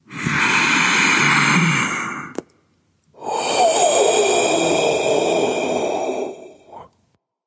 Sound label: nv breath; smite; voicepack; xbalanque